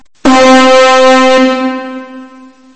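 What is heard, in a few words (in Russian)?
цепная пила мелодичный одиночный короткий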